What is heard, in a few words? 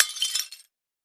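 Tile Debris Hit Hammer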